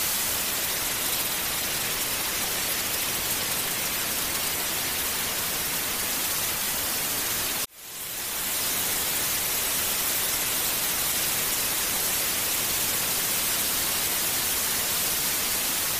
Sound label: white noise; focus